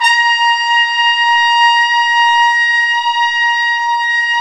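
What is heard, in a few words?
brass, Tpts Swel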